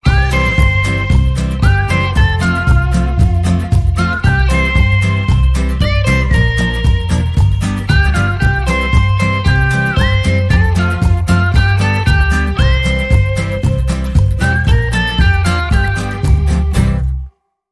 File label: Banjo